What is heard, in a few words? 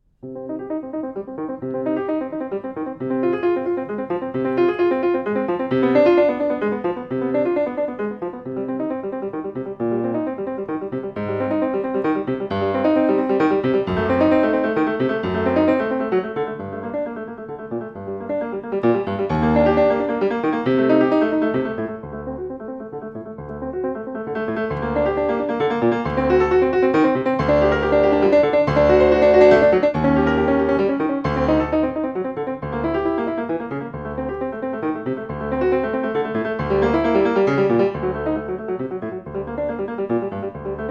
Prelude in C minor, piano; BWV 999; Bach; Lang Lang Piano Academy